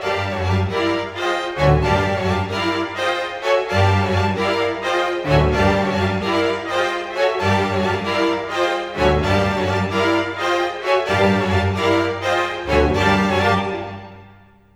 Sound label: Strings; Sonic Foundry (Sony Creative Software); Orchestral Series VOL-4; Rock and Pop; Rock-Pop 16